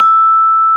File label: GENDER PANE, Bronze Gamelan